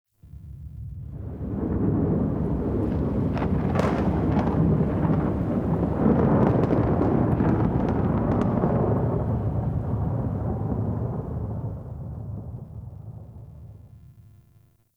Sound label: Exterior Rolling Thunder; Warframe; Ambience; Duviri